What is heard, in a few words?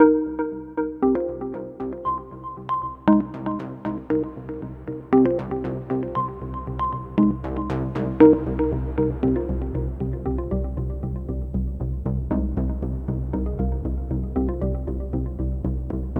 Hacking 5 Bar Intro 24 Bar Loop 117 Bpm